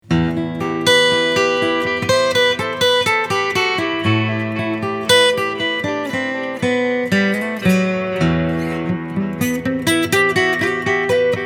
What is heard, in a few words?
Lava ME2 Reverb Delay